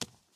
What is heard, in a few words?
run step concrete; Ben 10 - Hero Time Game